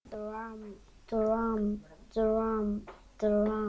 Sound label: drum